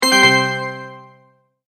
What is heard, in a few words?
multimedia tone, Goodbye; Tones; Sound Design; McKinney Sound